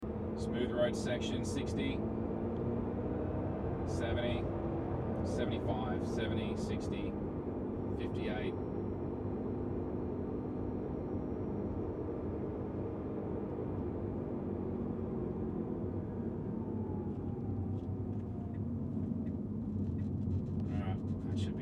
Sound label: Tesla Suspect Noise, model 3 sr started making a strange noise; at speed